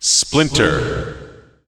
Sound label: appear splinter; Drunken Robot Pornography; Inago Rage